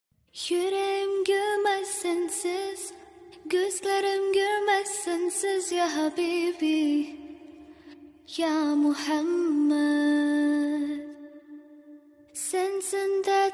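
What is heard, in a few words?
slow version arabic ringtone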